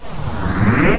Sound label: zoom in; Total Chaos Nukem 3d Pack; duke